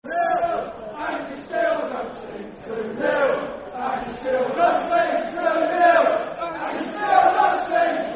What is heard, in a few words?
Football Chant (Soccer Song), Brighton; 2 Nil Still Don't Sing; Brighton Bhafc